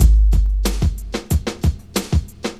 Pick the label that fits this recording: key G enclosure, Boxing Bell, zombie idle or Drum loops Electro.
Drum loops Electro